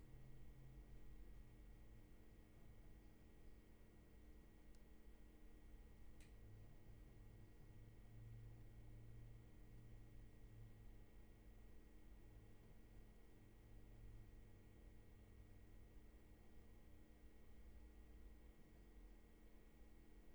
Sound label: roomtone